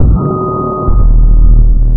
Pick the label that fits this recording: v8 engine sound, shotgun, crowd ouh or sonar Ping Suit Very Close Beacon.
sonar Ping Suit Very Close Beacon